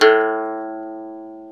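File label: ethnic plucks; Eth Plucks, Pluck Duo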